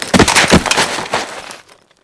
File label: go prone rustle; The Sum of All Fears